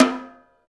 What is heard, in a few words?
St Snare Wires Off, Roland BK-5; Snares